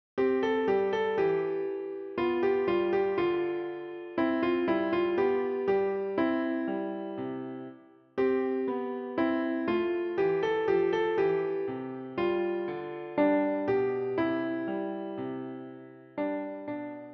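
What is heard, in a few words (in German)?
Klavier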